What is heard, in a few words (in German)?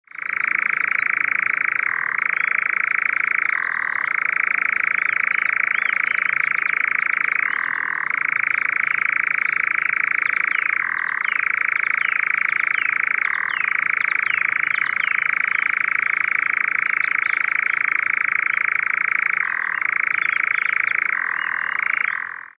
Ziegenmelker